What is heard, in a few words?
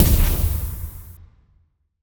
Plasma Magic Blast Impact; RPG; SFX; Cielonos